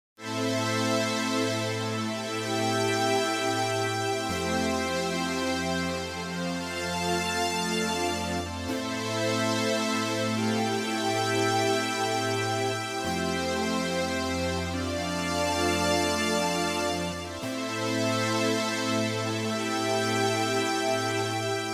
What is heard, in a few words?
glorieux pop